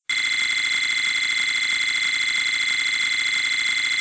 Level Win Score Count Long; fowl

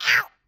Bird Damage; fowl; Bird Red

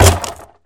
Wood Damage, Wood Blocks; fowl

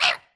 Bird Green Damage; fowl

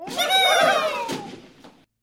Bird White Slingshot; fowl

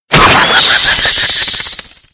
Halloween Pumpkin Bats Destroyed; fowl; Halloween Blocks

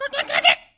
Bird Green Talk; fowl

